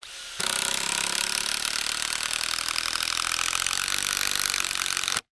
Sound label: industrial drill cordless hammer action drill screw into wooden sleeper; zapsplat